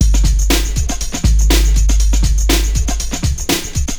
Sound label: NRG Break, Trance, Drums, Breaks